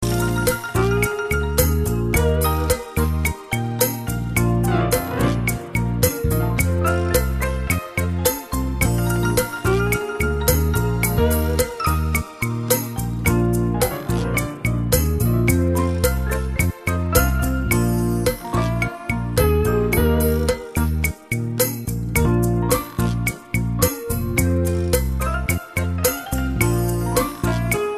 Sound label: Latin Slow Jazz; bluesjazz